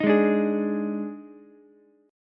D sharp Major 7th Suspended 4th, D sharp, down, Ukulele; strummed; D#M7sus4; JGuitar